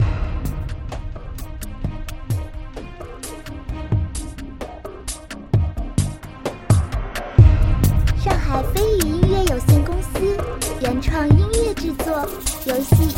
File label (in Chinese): 电子紧张